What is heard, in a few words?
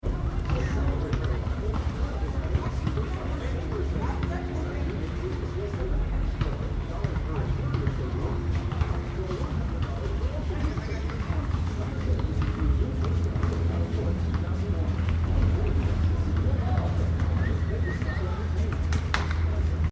City Park Basketball, Teenagers playing basketball on city park basketball court; City Sound Effects; LYN105 package of City Ambiences